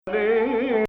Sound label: Hijaz Octave